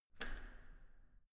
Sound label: entrance door handle down hard